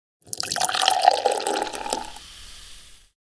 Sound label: Champagne pouring into crystal glass fizz